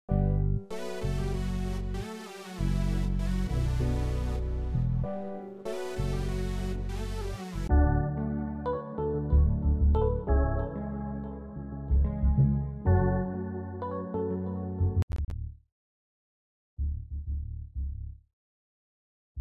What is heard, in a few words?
AfroBeats Sample Pack; ALURE